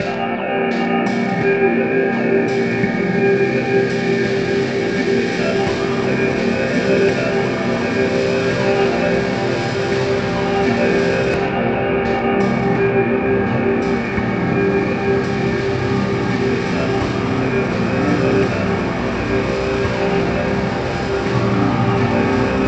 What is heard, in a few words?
STARDUST SYNTH; SLOW MELTING GROOVES